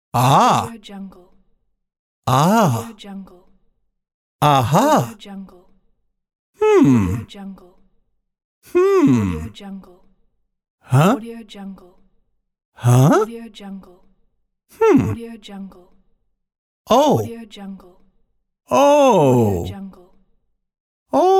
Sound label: male voice ah oh hum pack